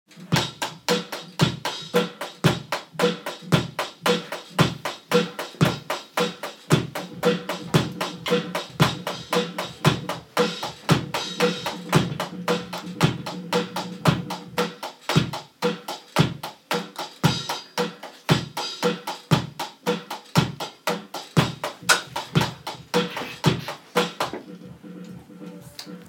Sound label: live drum recording